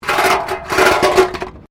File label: METAL BINLID SCRAPE WIRE FENCE